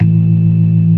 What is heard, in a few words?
ORGAN, NO PERC; Keith Emerson Signature Series; C3